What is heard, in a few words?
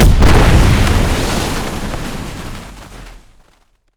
infernobomb; LoL; ziggs snowday